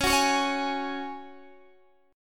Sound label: C sharp Suspended 4th C sharp down Guitar DADGAD